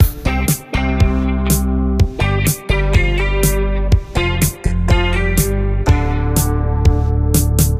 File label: Disco; Diner Dash Hometown Hero